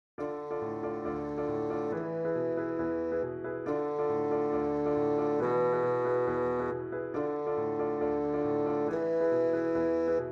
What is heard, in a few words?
Rhythmic Basson